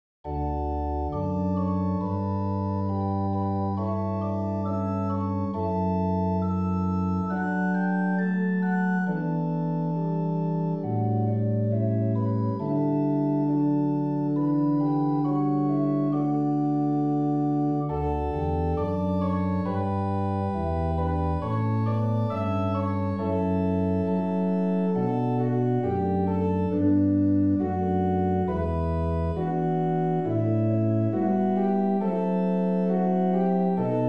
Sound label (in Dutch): SOPRAAN; Op weg